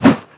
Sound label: spade heavy; melee weapons; tfa starwars melee weapons pack